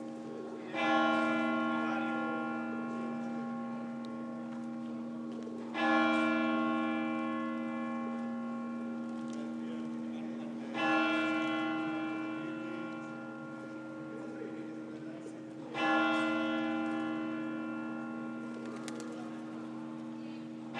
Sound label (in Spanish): campanas de la catedral de girona de cerca